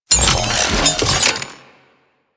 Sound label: Turret Deploy; Warframe; Corpus; Corpus Railjack; Admiral Agent